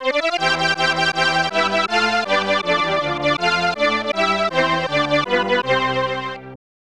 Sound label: Venezuela Anthem; timmy turners globe run 2023